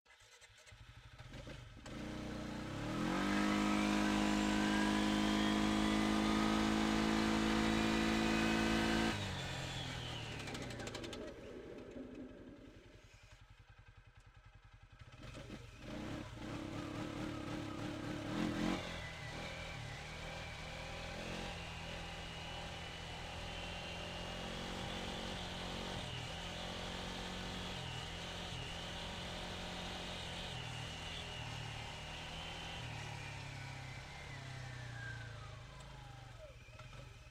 Textron Alterra 500 - ONBRD - FAST - Start Drive Stop Reverse Stop Drive Off - ENGINE